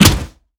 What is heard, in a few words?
Aircrafts Engines Packard Merlin Prop; DCS